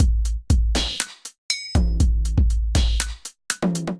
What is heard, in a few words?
Kit, Drums, Hybrid; All Nighter Kit; Ableton; Factory Packs; Drum Essentials